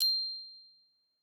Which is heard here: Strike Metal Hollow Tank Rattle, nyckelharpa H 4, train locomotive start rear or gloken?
gloken